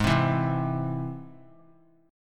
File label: Ab Augmented Ab; Ab+ strummed; JGuitar